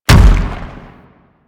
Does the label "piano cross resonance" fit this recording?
No